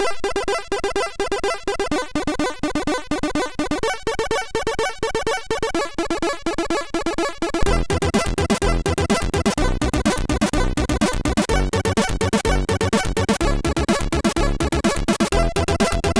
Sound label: Hard Bass, MOS6581R3